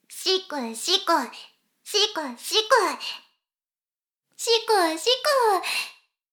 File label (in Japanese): しこしこ音声; 少佐殿; 牧場戦腺